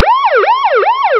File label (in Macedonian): policiska sirena